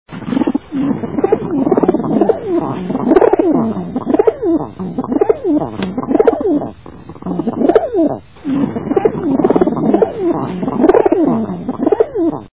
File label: Rock Pigeon, songs